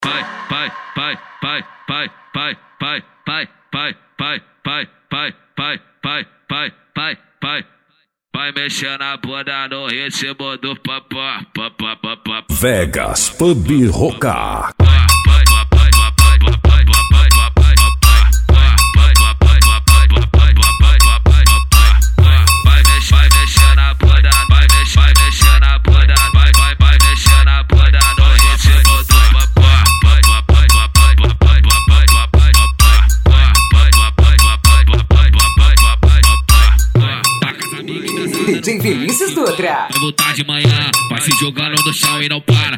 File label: funk mega bass